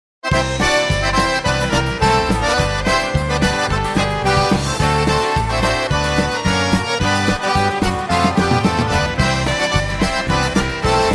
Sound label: Polka